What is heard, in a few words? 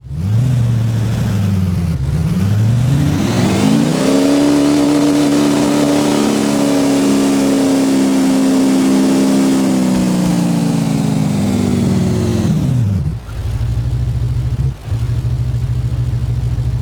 rev, vehicles cars; lwcars